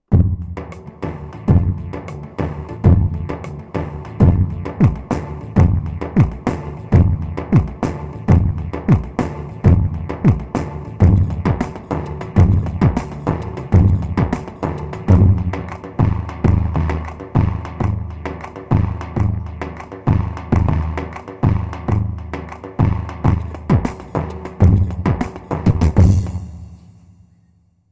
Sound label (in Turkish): pa 500 tr sivas dik halay, korg karisik ritimler